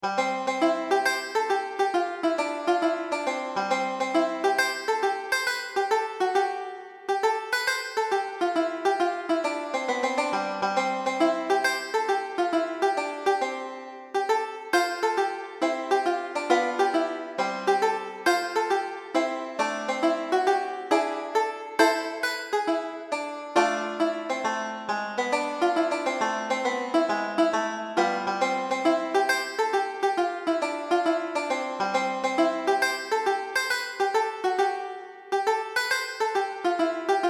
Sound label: Buckwheat Barn Dance